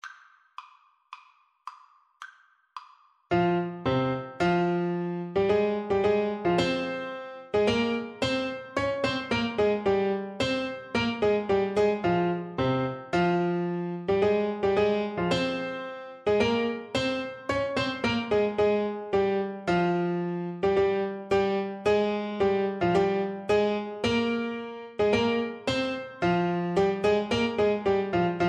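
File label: L'Arlesienne Prelude, alto saxophone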